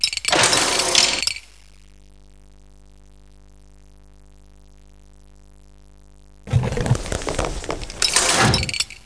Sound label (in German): Kamin; Max Kamin